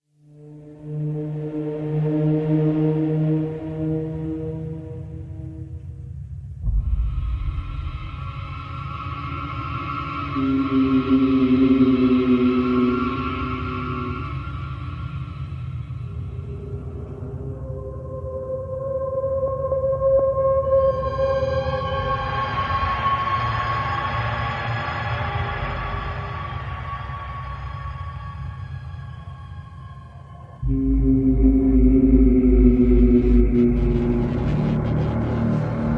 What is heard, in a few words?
Horror; The Surge